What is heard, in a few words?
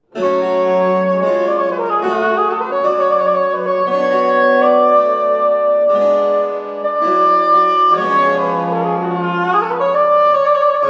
Adagio